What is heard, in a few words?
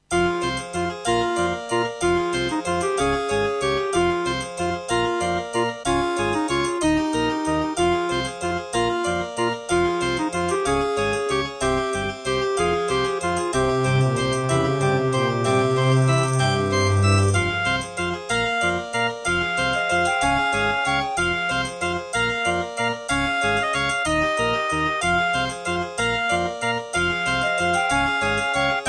Track 6, Terratec MiniWaveSystem SOWT-11; Death Gate